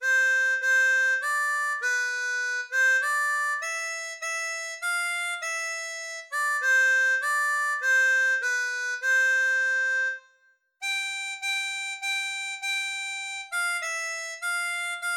Hymne Anglais, harmonica; Hymnes

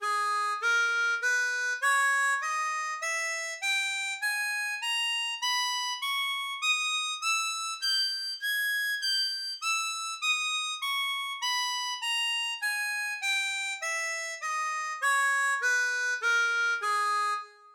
Sol D Mineur, Gammes, harmonica

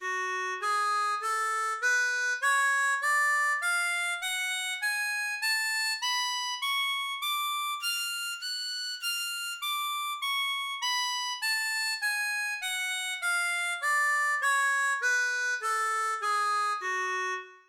Fa D Mineur, Gammes, harmonica

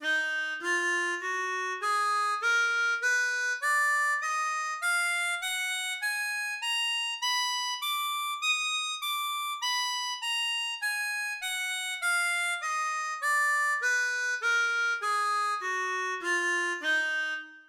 Re D Mineur, Gammes, harmonica

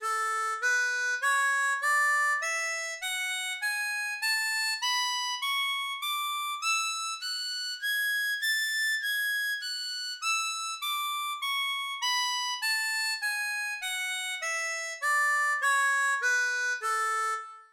La Majeur, Gammes, harmonica

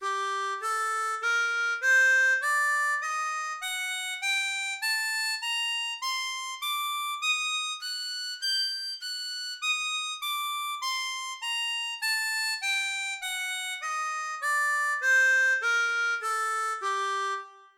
Sol Mineur, Gammes, harmonica

